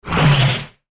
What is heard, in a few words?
Sci Fi Whoosh, Sci Fi Whoosh Evaporation Disintegration; Blastwave